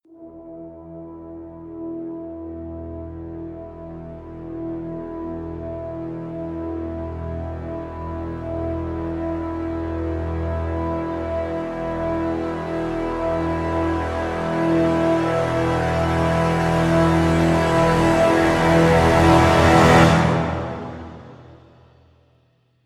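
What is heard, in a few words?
crescendo brass swell E; brand x music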